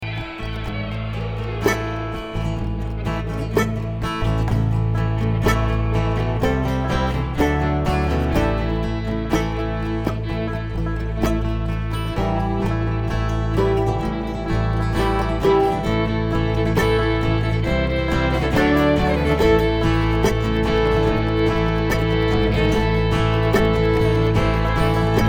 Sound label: Traffic Jam